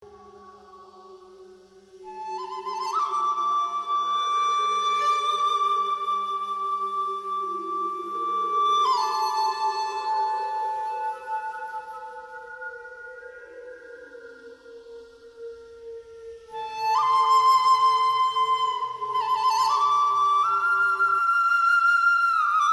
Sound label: Indian Spirit Music